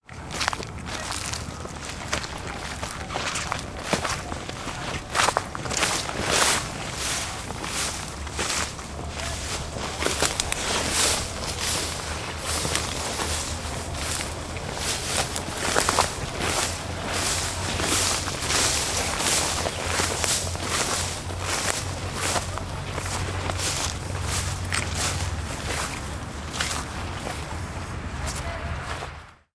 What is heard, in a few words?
Walking Through Tall Grass